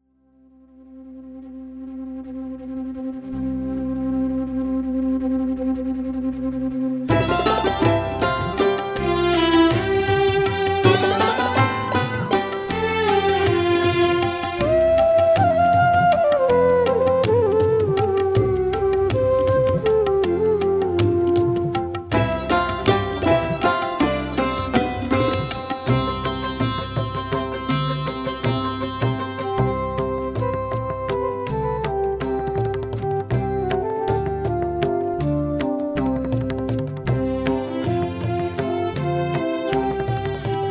Instrumental

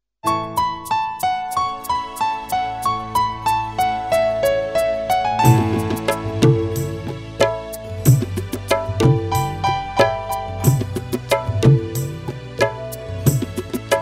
Karaoke music

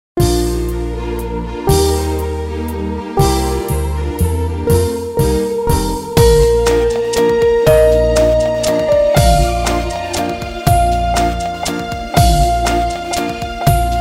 Piano Instrumental

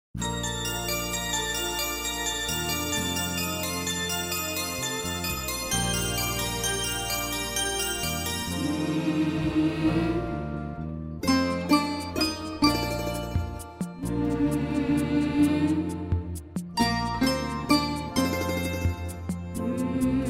Instrumental

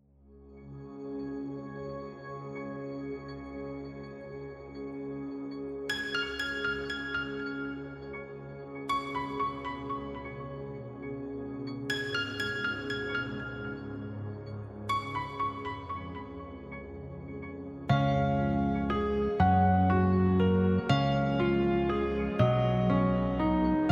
Instrumental Piano

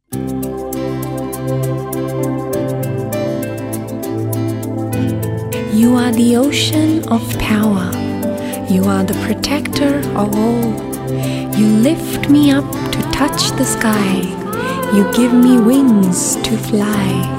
Guitar Instrumental

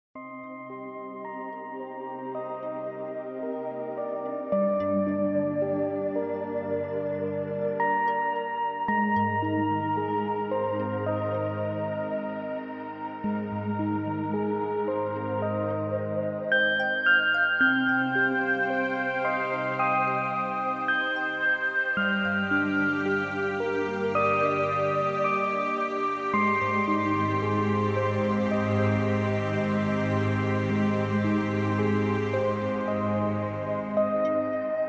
Meditation Music

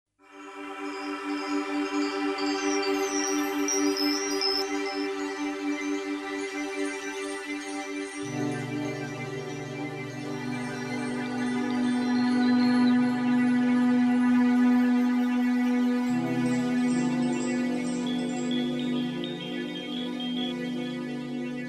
Atmosphere

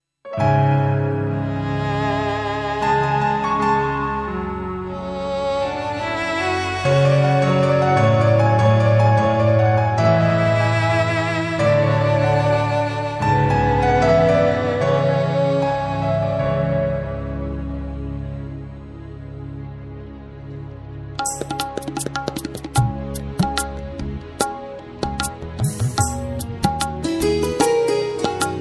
KARAOKE

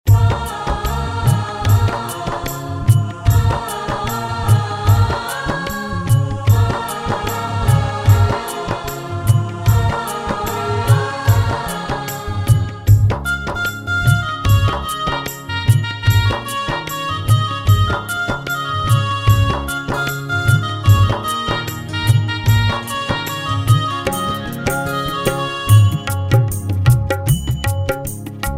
Karaoke Music

